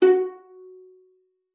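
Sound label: violin pizzicato F#4; Xsample VOL-5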